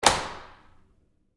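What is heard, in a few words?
Door Bathroom Stall Lock Close Up Turn Fast; Big Room Sound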